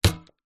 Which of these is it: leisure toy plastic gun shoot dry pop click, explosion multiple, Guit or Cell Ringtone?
leisure toy plastic gun shoot dry pop click